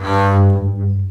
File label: CBS G2 MARCATO, String Collection; East West - The Ultimate String Collection